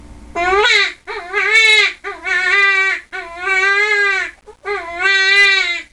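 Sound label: white-tailed deer sounds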